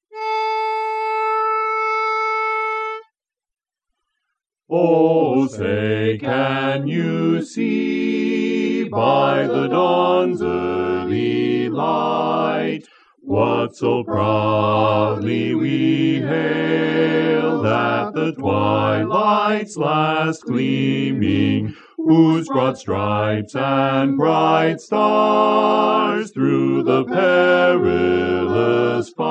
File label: Star-Spangled Banner bass; Barberpole Cat I Learning Tracks